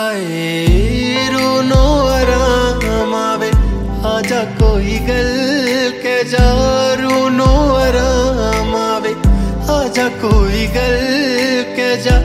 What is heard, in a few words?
ringtone bollywood ringtones